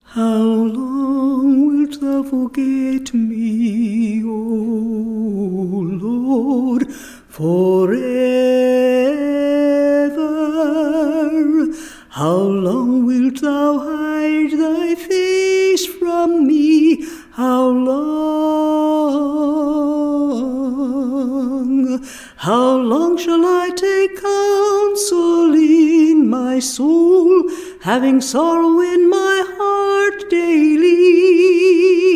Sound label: a capella scripture song; psalm